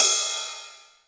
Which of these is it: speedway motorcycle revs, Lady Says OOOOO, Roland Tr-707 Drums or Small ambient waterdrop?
Roland Tr-707 Drums